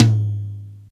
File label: hi tom drum one shot g sharp